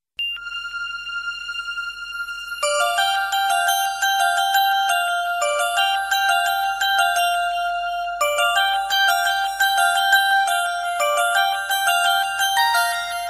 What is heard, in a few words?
morning raga ringtone